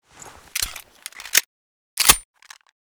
p99 reload empty, weapons

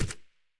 scar silencer mono far

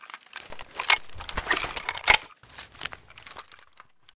dvl reload, weapons; dvl10

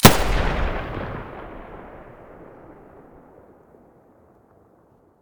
muzzle ump45 stereo, weapons

